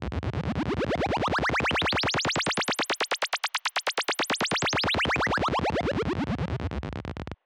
fx oneshot siren sweeps complex osc long spring verb; Deep Space Textures